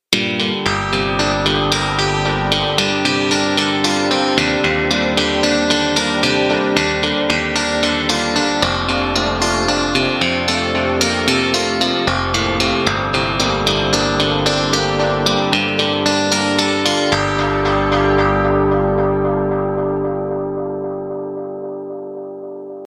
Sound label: guitar